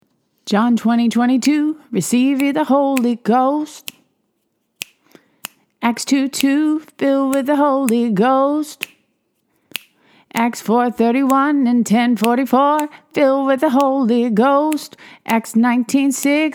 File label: Holy Ghost II raw